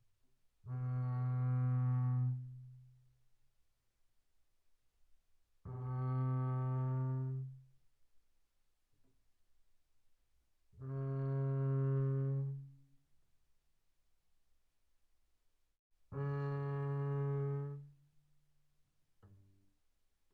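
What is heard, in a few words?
Bass arco sul E pianissimo B2 Db3, double bass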